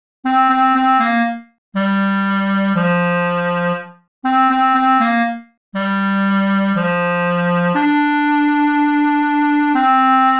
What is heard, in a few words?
clarinet